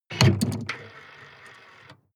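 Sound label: sports car exterior trunk unlock; verkehr transport; autos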